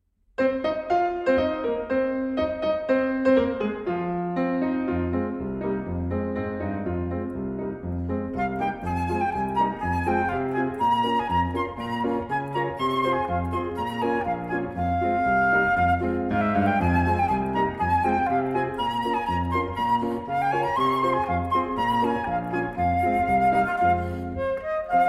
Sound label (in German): Trompetenschall am Morgen